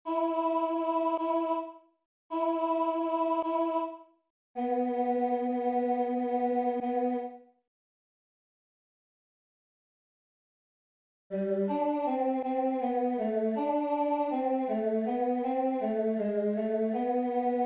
Sanctus Tenor; Rossini; Messe Solennelle

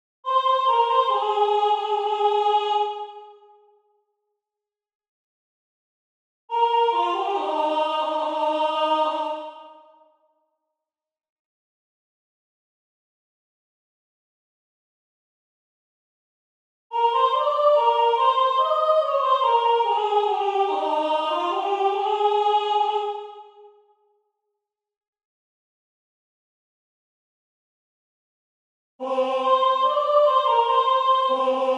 Alto; Faure; Messe Basse; Kirie

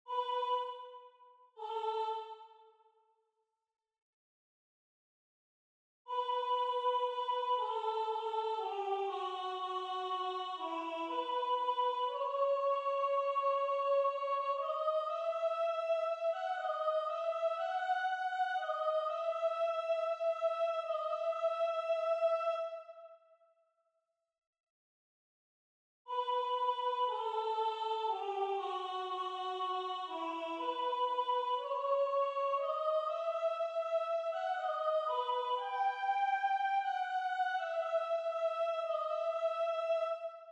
Soprano; Dietrich Buxtehude; Weg mit allen Schätzen

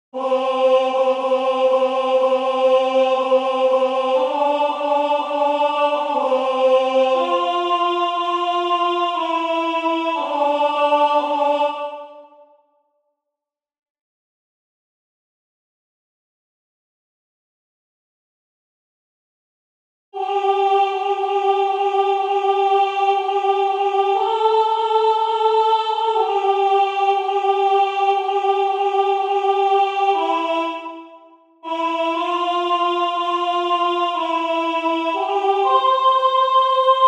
Messe solennelle Et Expecto Alto; Gounod

